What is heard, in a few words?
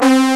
LINEAR SYNTH